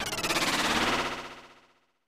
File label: Battle Intro; Metal Max Returns; SNES